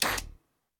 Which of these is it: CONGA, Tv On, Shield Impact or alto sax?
Tv On